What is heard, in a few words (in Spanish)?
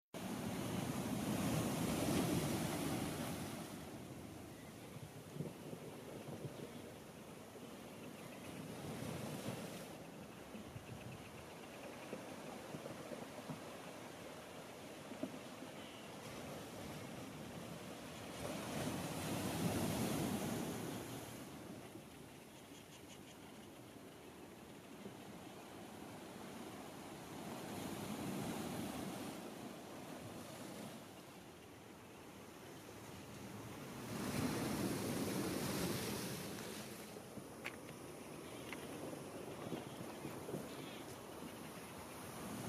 MAR CONTRA ROCAS Y AVES ISLA SAN LORENZO